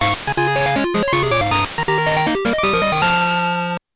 Receive Badge; P3D-Legacy

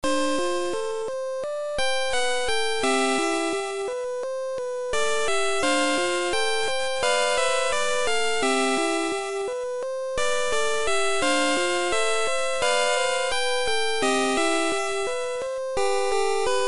lullaby; pokemon; P3D-Legacy

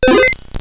Save; P3D-Legacy

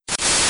repel use; P3D-Legacy

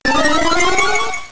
Stat Raise; Battle Effects; P3D-Legacy

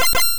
Frozen; Battle Effects; P3D-Legacy

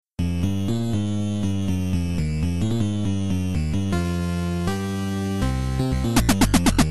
battle; space legend; P3D-Legacy; 2.5DHero; intro

